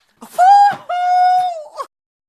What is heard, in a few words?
woooohoooo